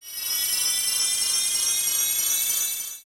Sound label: Great Fairy Appear